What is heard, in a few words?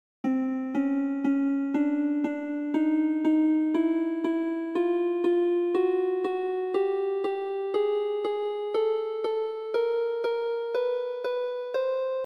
hanon